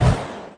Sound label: Lich attack effort